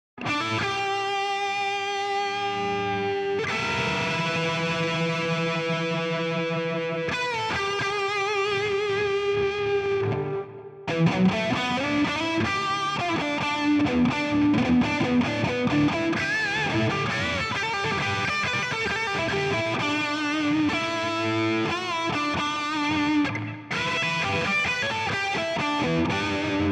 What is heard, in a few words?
lead jcm 800; mercuriall spark test